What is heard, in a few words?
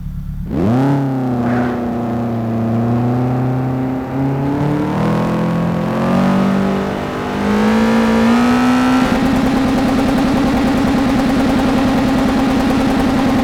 rev; sgmcars